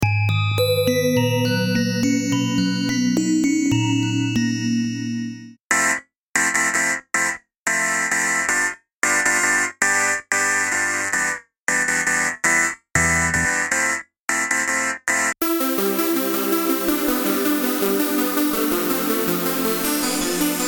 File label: Keys; tassman4 electronic toolkit; Demos produced by Electric Himalaya